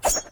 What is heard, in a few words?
Disk Throw